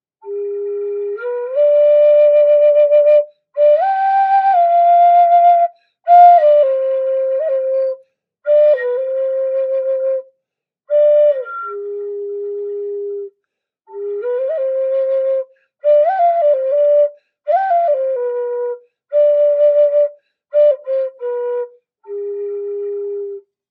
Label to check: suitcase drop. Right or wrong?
Wrong